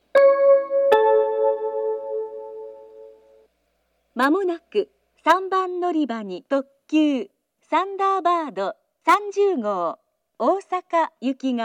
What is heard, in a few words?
toyama limited express; 富山駅 3番線; 接近放送; 特急; 大阪; 特急サンダーバード30号 大阪行 9両編成です。; 途中、高岡、金沢、加賀温泉に止まります。; station; jr-west; thunderbird30; osaka